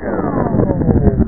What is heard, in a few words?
Puzzle transform; Giza 2.0